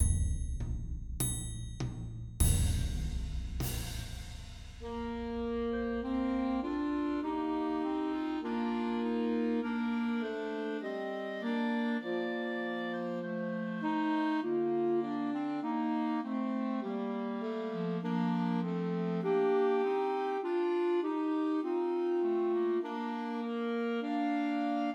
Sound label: Christmas Trios for Two Clarinets and Alto Saxophone; See Amid the Winters Snow